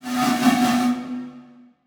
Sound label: Transition Whoosh Medium; Production Element; Title Transition; AdobeCC